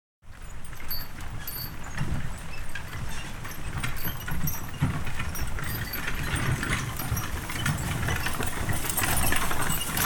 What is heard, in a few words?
Horse Drawn Wagon By; Electa Quinney